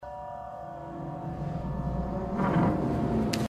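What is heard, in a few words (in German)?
Türe geht auf Feedback; inside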